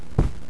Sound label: Female hit ground